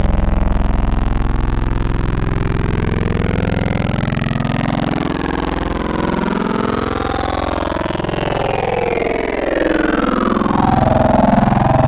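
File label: Vocal Formant Bot, wavetable; Synthetic's Serum Collection; Echo Sound Works Core Tables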